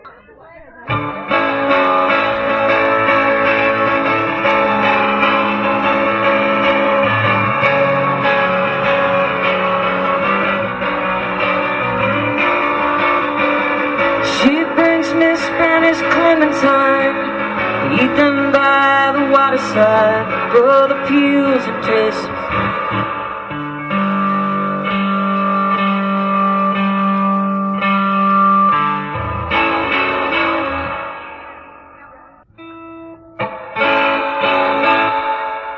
soundcheck

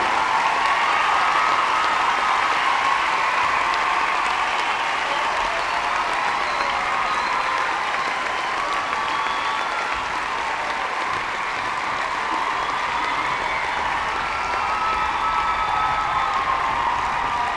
crowd noise; memorial auditorium; burlington, vermont

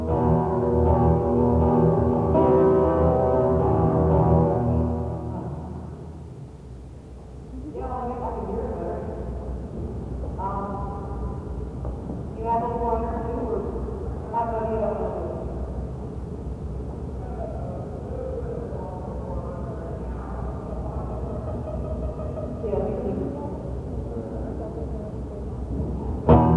soundcheck

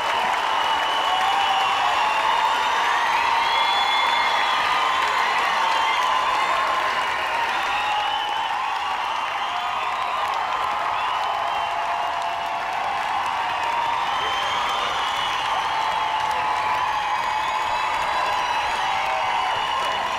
crowd noise; stratton mountain; stratton, vermont

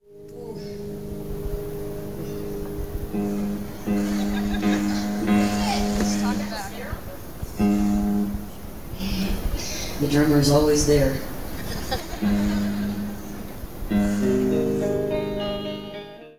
drummer; drummer always there (las cruces 1996)

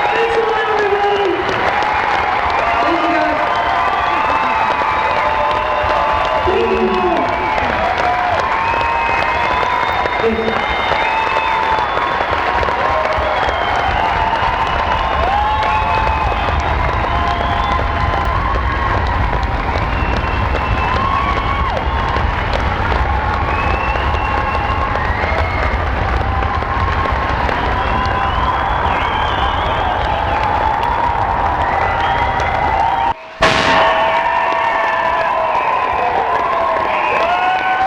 crowd; canberra theatre; canberra, australia

